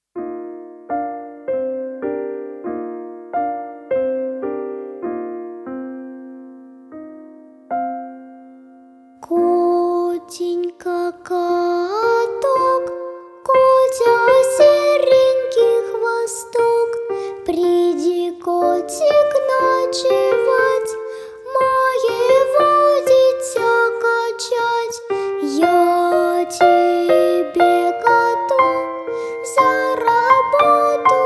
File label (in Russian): Колыбельная; Котенька-коток